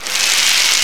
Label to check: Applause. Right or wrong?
Right